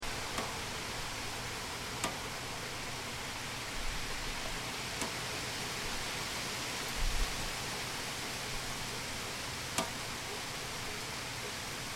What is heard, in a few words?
Rain With Raindrops On A Metal Window Sill; Weather; Crafted Signals